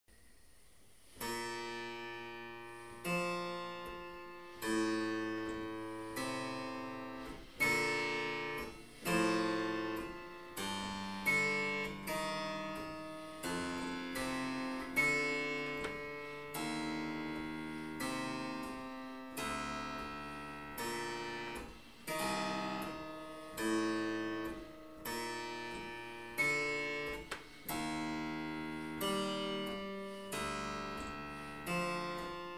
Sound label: harpsichord; Pedal instrument alone, first 1 x 8' followed by 2 x 8'; Sorli Harpsichords and Lautenwercke